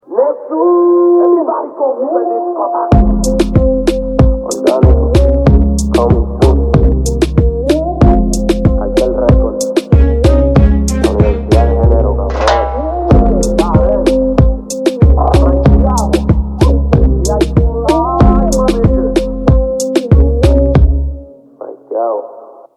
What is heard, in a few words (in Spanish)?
remix instrumental